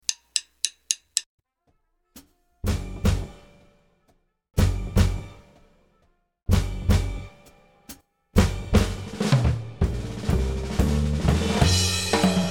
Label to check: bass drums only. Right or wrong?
Right